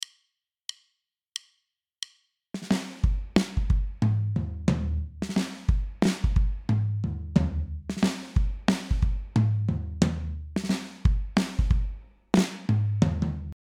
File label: Intro Slow